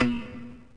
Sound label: bounce ring; gamerip; stardunk